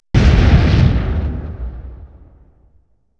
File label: Explosion, 5.1 Surround; Sony Pictures Sound Effects Series Volumes 1-10; Sony Creative Software